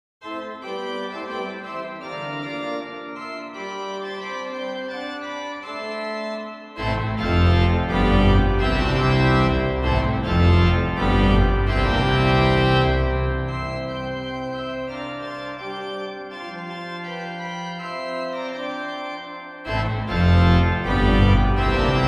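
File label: christmas fanfare, organist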